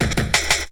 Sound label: Essential beats